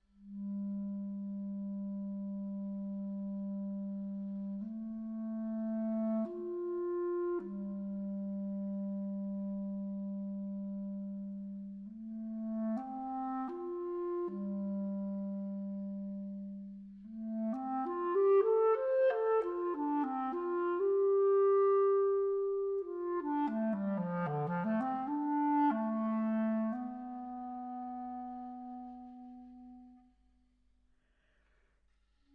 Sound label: Suite for Solo Clarinet II